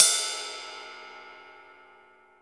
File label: Cymbals